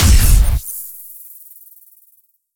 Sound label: Sci-Fi Weapons-Sniper Rifle Single Shot; Robobeat